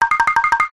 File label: cartoon running start